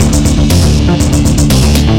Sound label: fire backing track 120bpm; Adventure Time - Elemental; ThrillGames